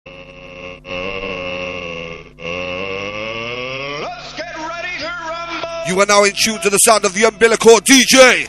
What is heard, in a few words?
Drum and Bass Jungle Old Skool; UK Bass Radio; LIVE FORM CARDIFF